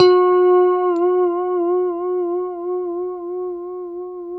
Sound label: G4 pick harmonic, Guitars; Spectrasonics; Hans Zimmer Guitars VOL-1; JC-HARMONICS